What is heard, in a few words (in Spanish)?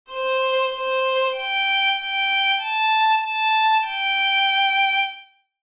Melodia, violin